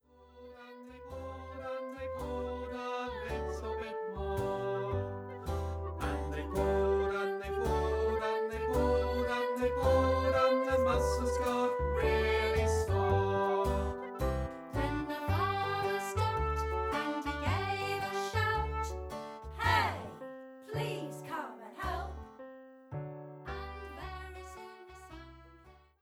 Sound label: The Giant Turnip with vocals